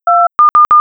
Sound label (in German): Aufschaltung